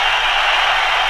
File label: Crowd; Proteus 2000; Digital Sound Factory Proteus 2000